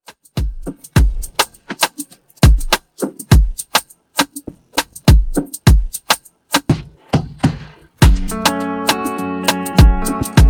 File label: Redrum 102 Bpm